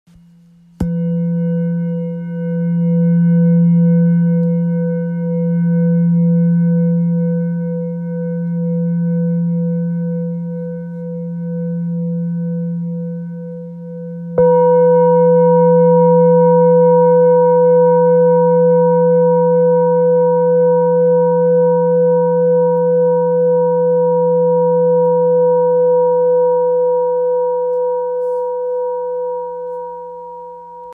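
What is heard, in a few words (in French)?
Bol therapeute do, bols chantants tibétains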